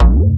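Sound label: TALKING DRUM; E-MU Formula 4000 Series Vol. 2; OrbitPercMaster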